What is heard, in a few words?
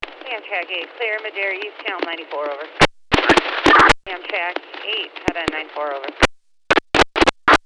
Amtrak